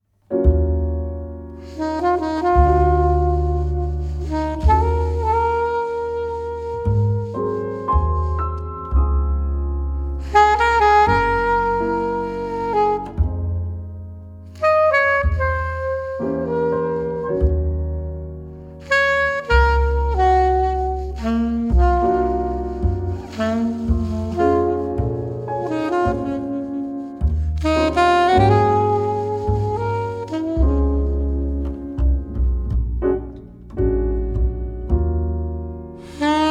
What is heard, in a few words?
Trio; band muenchen musik